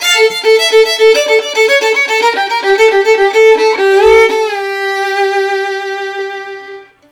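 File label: VIOLIN LOOP, Asian Traditions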